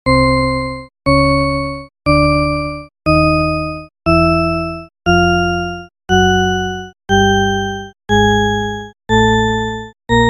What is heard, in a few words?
shepard note